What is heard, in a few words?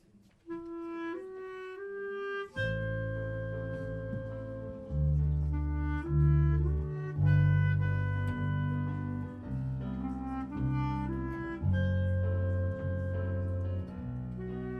impro; enregistrements du 15 novembre 2017 avec impros